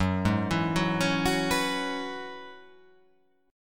F sharp 7th Suspended 2nd Suspended 4th F sharp down